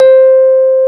electric pianos, C 2; Wizoo

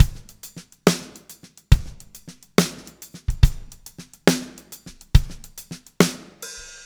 LA SHUFF

